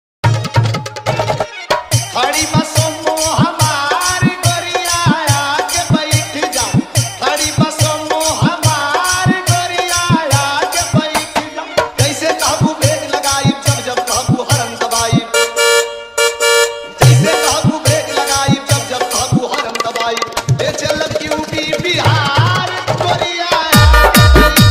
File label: dj remix hardbass